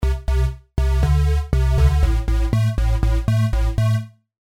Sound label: Synthesizer parameters ADSR A short R med